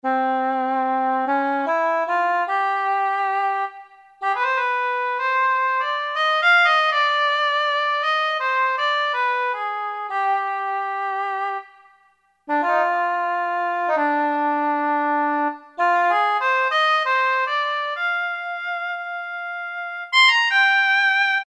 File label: Oboe Blow; Factory Preset examples